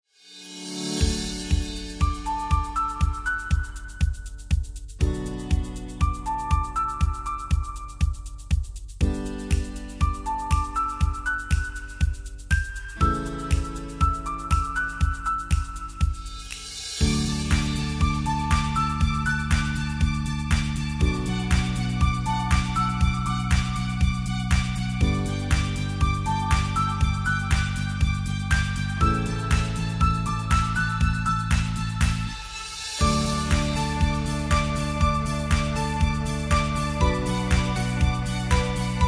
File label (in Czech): klavir